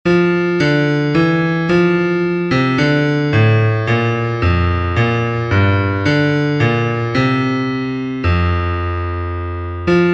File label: Bass